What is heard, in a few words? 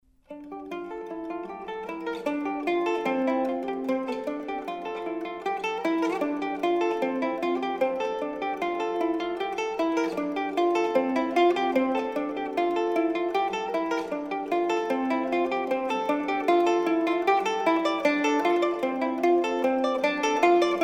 mandolin; Capriccio